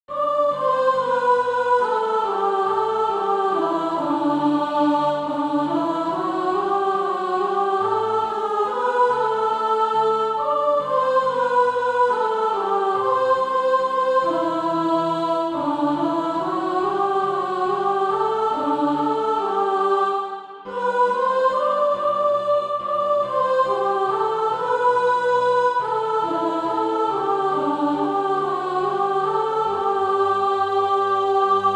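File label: soprano; Even the Waifs of the Street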